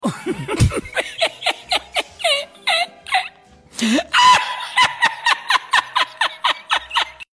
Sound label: spanish laugh